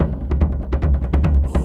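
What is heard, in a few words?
Music Shield; From Dust; PS3; Xbox 360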